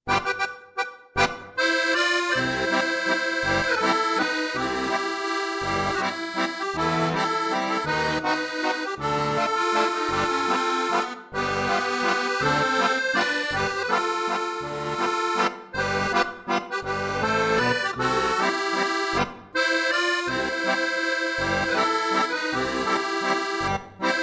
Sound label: Accordion, Itallian Song